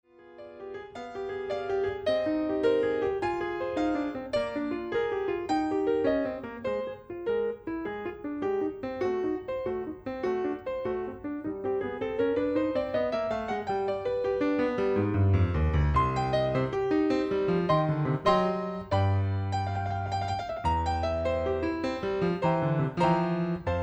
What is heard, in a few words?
fantasia in c minor